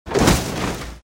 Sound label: Metal Hit Large; monster truck destruction; android